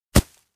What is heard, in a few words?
Foliage Impact Object Dropped on Ground; hits & impacts